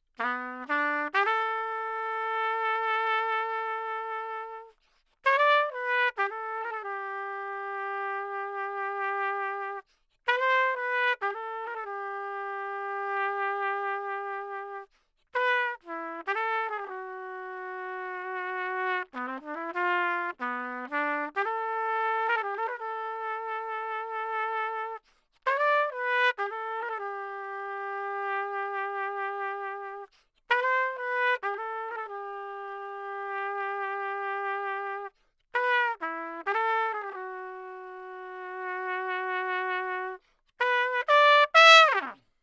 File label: trumpet; lewitt